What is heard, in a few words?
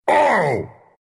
pain, ru; dota2